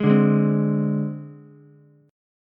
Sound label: C Suspended 2nd Sharp 5th, C, Ukulele; strummed; JGuitar